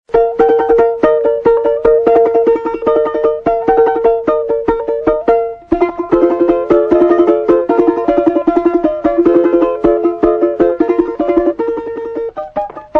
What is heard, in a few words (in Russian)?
светит месяц балалайка